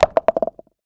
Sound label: Plastic Roll, Crashes